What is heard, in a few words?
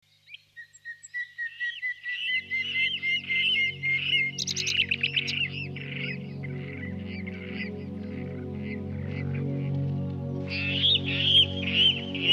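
pastushok golos, water rail voice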